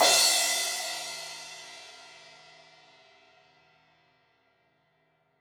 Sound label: Crash 16in edge, Cymbals; Deeply Sampled Drums